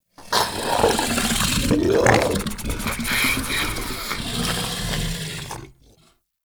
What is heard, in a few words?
Ancient Tank Idle, Enemies, Infested, Barks; Warframe